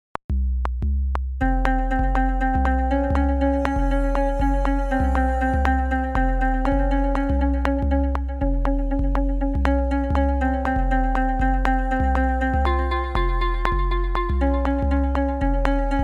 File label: Game music